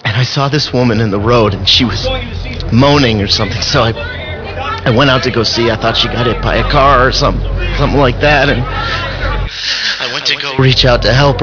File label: lumber radio